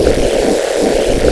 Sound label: siren loop